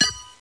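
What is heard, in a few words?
horseshoe, sports; CD Mania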